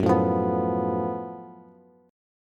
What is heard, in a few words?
Gb Minor Major 11th Gb down Bass Bass Standard; GbmM11 strummed; JGuitar